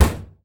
metal panels, metal dull thud

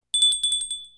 Chrystal Bell Short; VRChat; Avatar

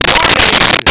mourning, chick; Nintendo

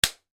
human hand slap around face; VRChat; Unity Usable Avatars

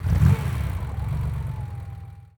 warthog in, vehicles; new warthog left

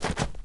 jump, footsteps dirt; elite

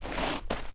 dirt shuffle, footsteps; chief

